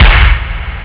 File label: bas drum; robocop; ST-XX Amiga Soundtracker Sample Packs